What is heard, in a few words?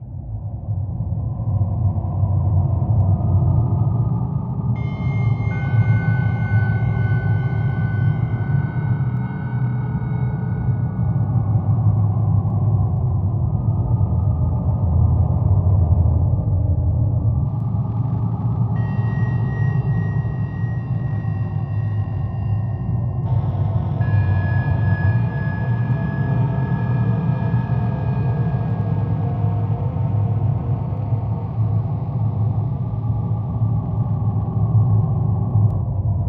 Ambience; Kuon; PS2